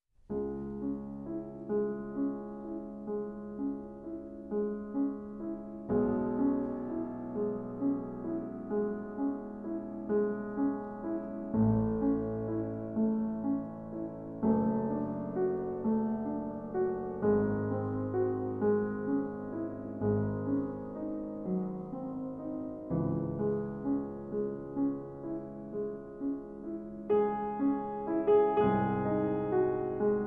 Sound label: Piano Sonata in C Sharp Minor, Adagio; Beethoven; No. 14; Op. 27, No. 2 Moonlight; 1. Adagio sostenuto